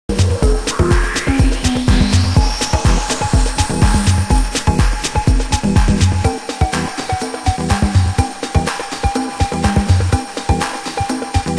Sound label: drum